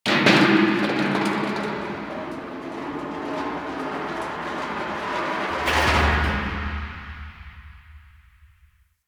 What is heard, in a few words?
Subway Garbage Amb; Warframe; Nineteen Ninety Nine